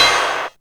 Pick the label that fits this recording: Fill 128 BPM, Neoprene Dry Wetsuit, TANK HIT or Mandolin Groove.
TANK HIT